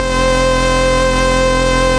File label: Synthesizer Middle C Notes, Lead